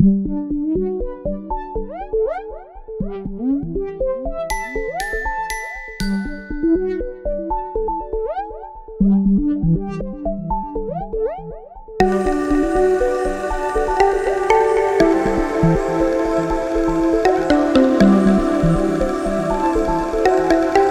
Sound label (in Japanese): ゆったりした楽曲, 森、不思議